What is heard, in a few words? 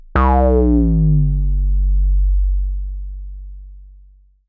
bad roll; Dice World